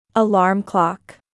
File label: Alarm clock; bedroom